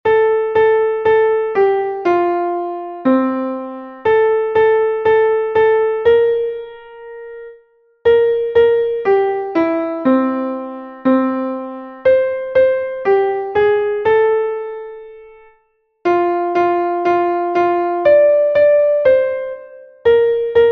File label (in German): klavier; heile heile gaenschen; melodiemeister